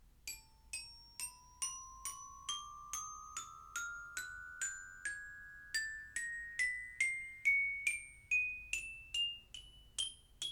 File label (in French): Carillon Chromatique La Fa mallette